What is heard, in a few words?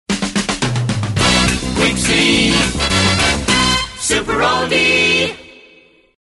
Super Oldie Jingle